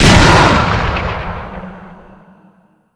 awp; valorant weaponsound

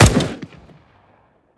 sg552 fire; weapons; covert

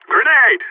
grenades; covert

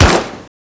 elite fire; weapons; covert

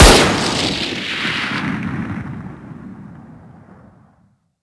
scout fire; valorant weaponsound

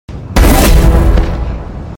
killsound; valorant

